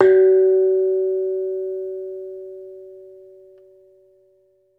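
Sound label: METALOPHONE, Pitched Percussion; E-MU Producer Series Vol. 7 – Old World Instruments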